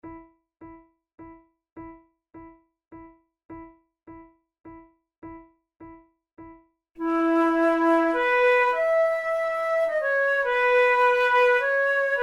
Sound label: two flutes menuets; Monteclair; 6 concerts; flute tunes